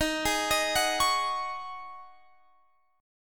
C sharp Suspended 2nd Suspended 4th D sharp, chord